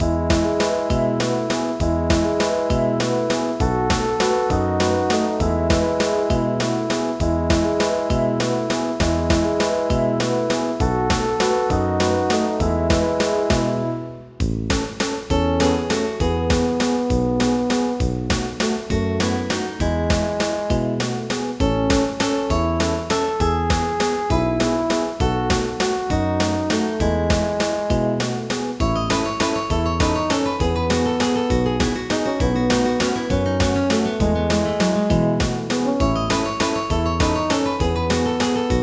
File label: waltz